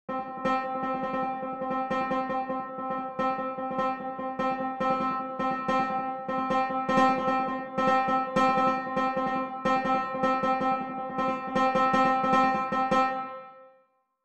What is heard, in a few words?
repetition comparison C3